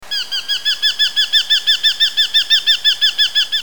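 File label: Eurasian hobby; tigohayabusa; toritori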